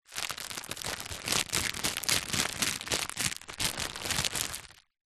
household wet wipes packet plastic movement in hand; zapsplat